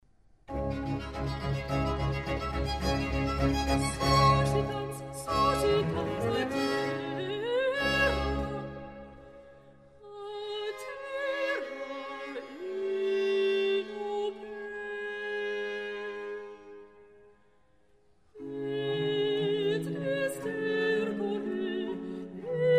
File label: for Soprano solo; Laudate pueri Dominum; (Psalmus 112) RV600; Antonio Vivaldi